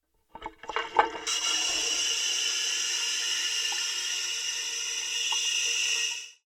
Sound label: flush mic